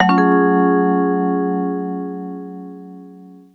TV Suspense Vibez-F#, 135; Zero-G Kocktail Kollection; Construction Kitz (Lounge); TV Suspense Mixes 135

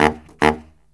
Killer Brass Riffs

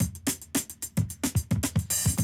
110, Classic Drum Loops

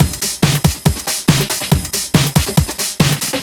Break Beats, 140 BPM

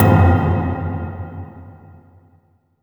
hollow tub, amb. mallets; Spectrasonic Distorted Reality 1

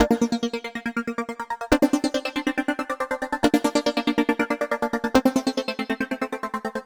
Arp 140bpm G, Trance

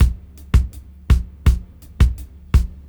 Funkragga 82 BPM; AMG - Keith LeBlanc Phat Butt Jams

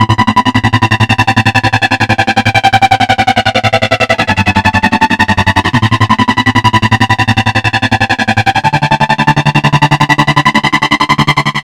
A, Strings, Drum & Bass; Gateway 165; Total Drum&Bass

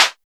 MOVE PRC, TEK PERC, Drums; TEK PERC2

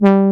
JX BRASS, Jupiter Synths; E-MU Formula 4000 Series Vol. 3; Analog Odyssey

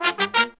Horns, 96BPM; 90 s Sample CDs; Zero-G; Phantom Horns

